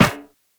Run Metal Perforated, Footsteps; Warframe; Characters; Operator

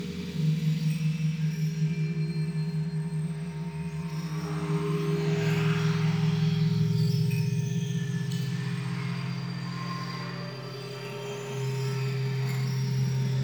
Ambient Loop; Warframe; Venus Open World; Venus Solaris United; Exterior

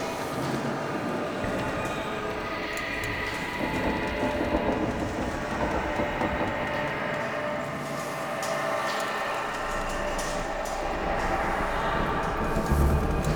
Interior Library Bed Loop, Ambience; Warframe; Entrati

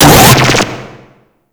Vay Hek Drone Zap Attack Start; Warframe

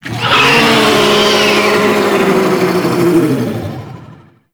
Pain; Warframe; Tau; Sentient Dactolyst

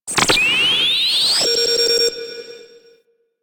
Mine Detonator

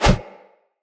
Husk Whoosh, Unarmed; Weapons; Enemy; Mockery Husk

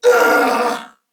Pain, Male; Corpus Venus; Warframe; CorpusVenusMaleA

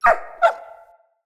Wolf Pain Yelp; Animals

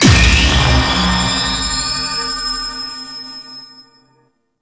Enemy Spawn; Warframe; Duviri; Dax

